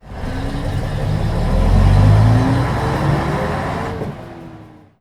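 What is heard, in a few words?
leyland atlantean an68, bus; Simutrans; Pak128.Britain-Ex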